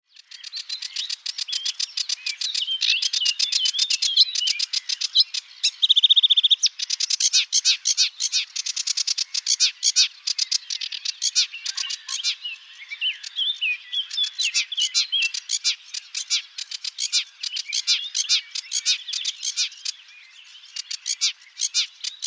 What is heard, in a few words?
Acrocephalus palustris